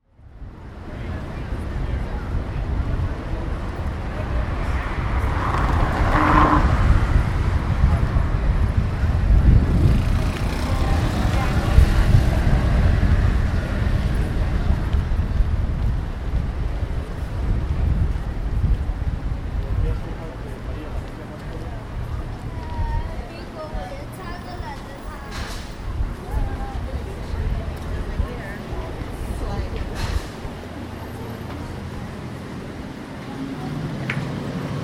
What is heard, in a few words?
china town door; san francisco